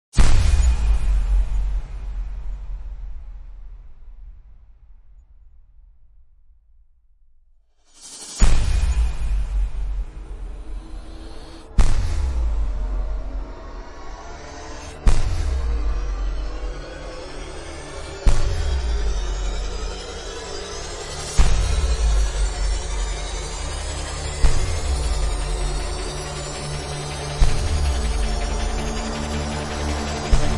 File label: Risers Hyperbolic; Gothic Storm Music; Advanced Sound Design